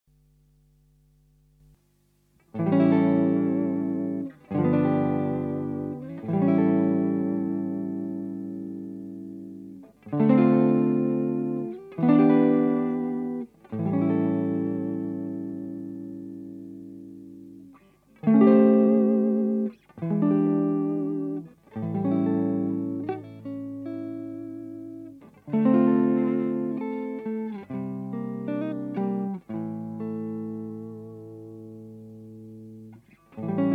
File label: strat clean o cranck